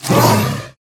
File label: Spider Grunt General Scream Start Combined